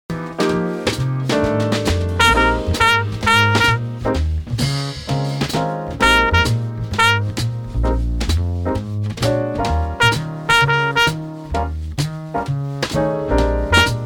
rhythm

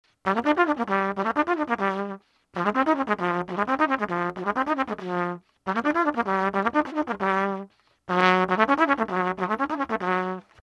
arpeggio

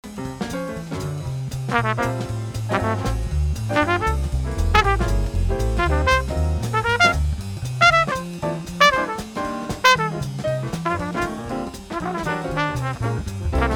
foggy day sparse

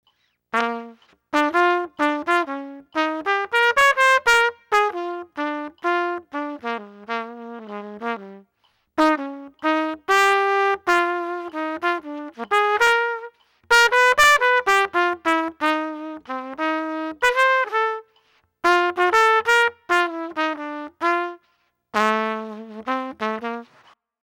solo

